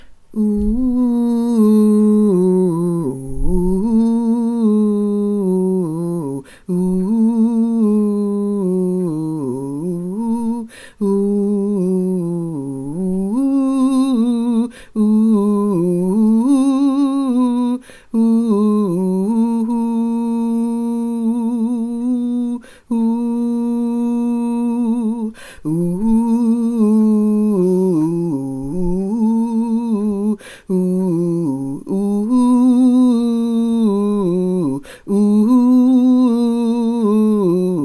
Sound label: TENOR